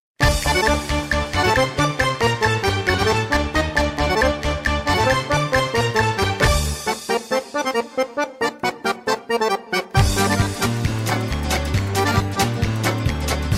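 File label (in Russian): accordion; zolotoy akkordeon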